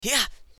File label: Hyah; Assemblee SFX